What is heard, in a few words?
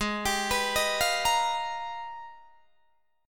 Ab Minor Major 13th, down, Guitar, DADGAD